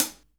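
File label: HI HAT, JAZZDRUM; Ueberschall; Drum & Bass Flash Trackz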